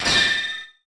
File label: equip